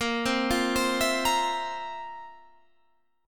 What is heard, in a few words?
F Major 7th Suspended 4th Bb